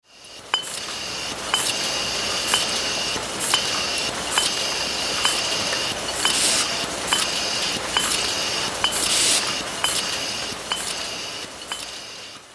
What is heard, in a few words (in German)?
flaschenfuellstation